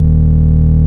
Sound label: ORG VOX D#, Keys of the 60s and 70s, Continental; Roland LCDP09 Keys of the 60s and 70s 1